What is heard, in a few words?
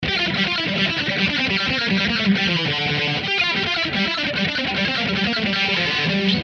Chorus Speed Rock G